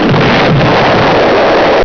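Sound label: explosion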